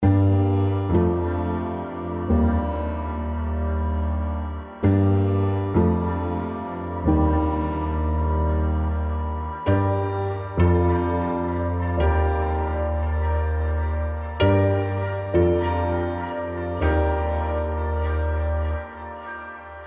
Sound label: Punchy Plucker; ASCEND